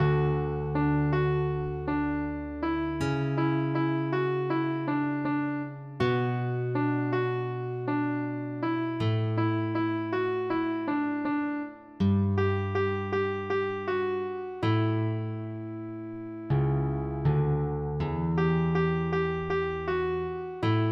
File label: piano slow; slow tempo; PSE Music; PlayScore version